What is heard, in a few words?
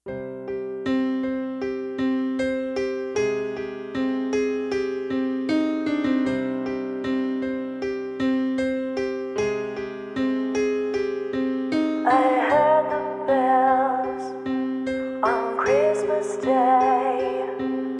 tibet bells